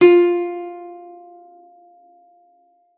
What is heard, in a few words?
F4, GUITAR; Xsample VOL-2